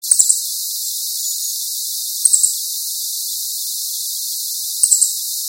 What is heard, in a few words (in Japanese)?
hojiro